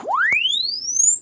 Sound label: Press Two Sides Chirp; Samsung 4 Recordings